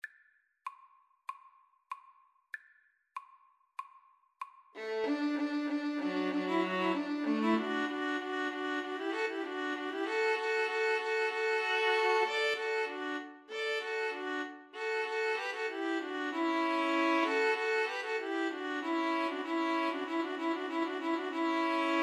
rejouissance, String trio

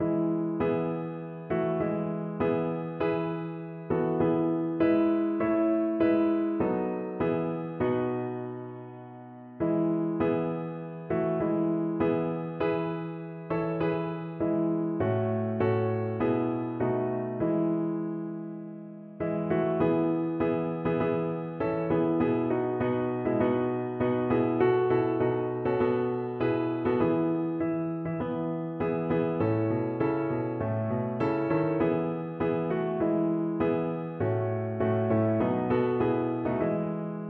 at the cross, piano

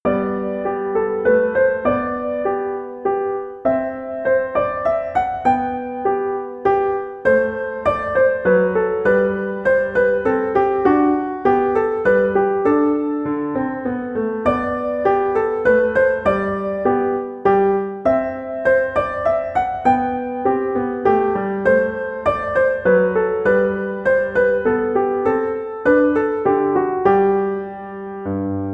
minuet, piano; bach

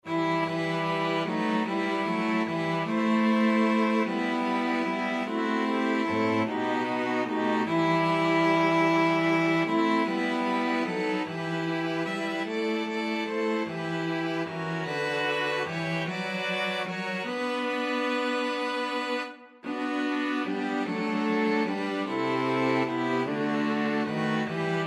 holly and the ivy, String Quartet

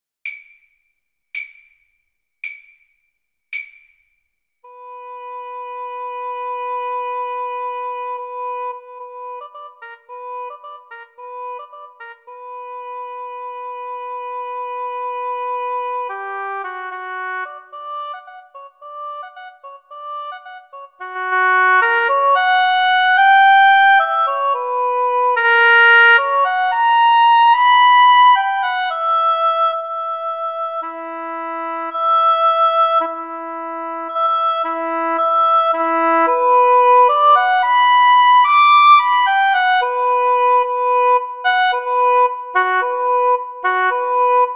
Oboe Duet

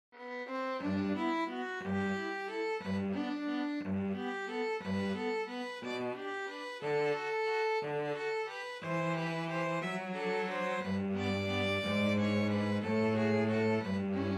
tchaik serenade, string trio

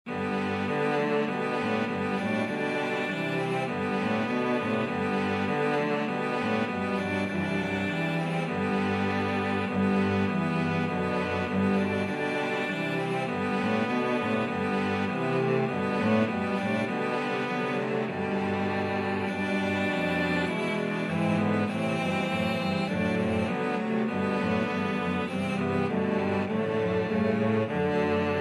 cello quartet

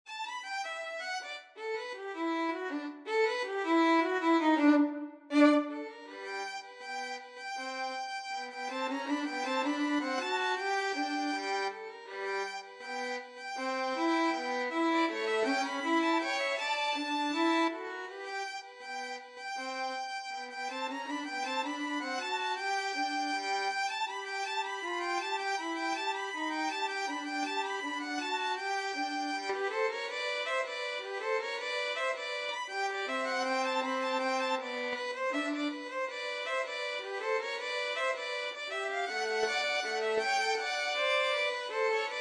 the entertainer, violin duet

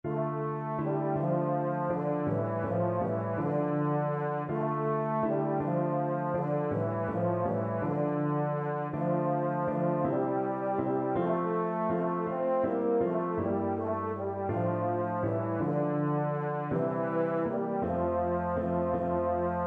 euphonium

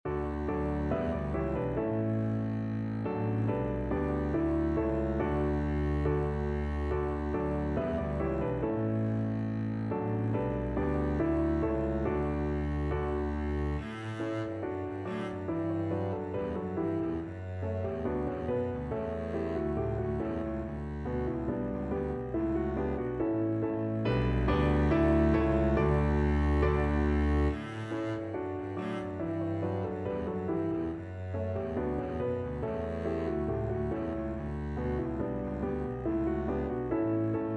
ding dong, double bass

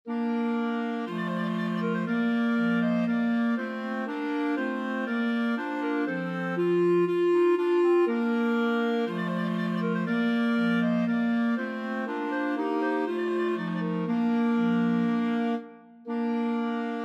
trumpet voluntary, clarinet quartet

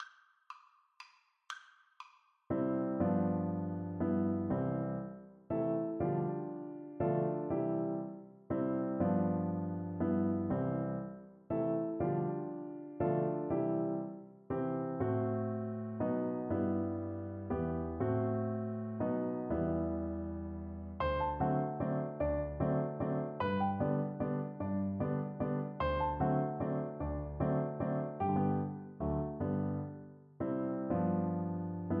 saper vorreste, Flute; verdi

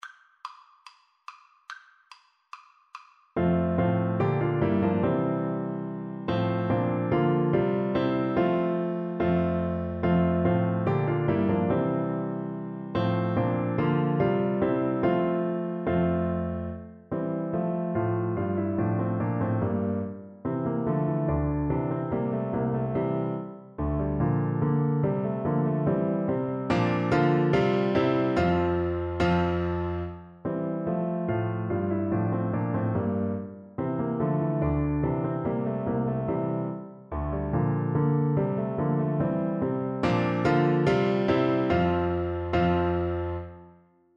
flute

